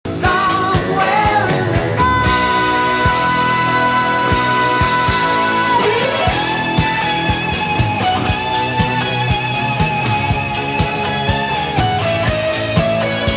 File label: Guitar Solo